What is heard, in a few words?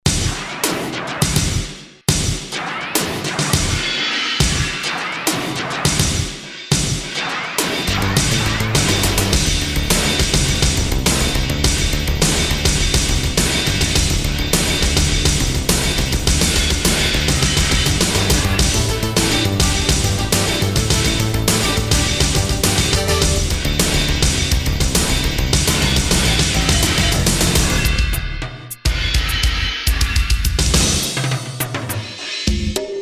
korg m1r synth